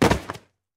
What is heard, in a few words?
tile fall; Golden Crayon Sounds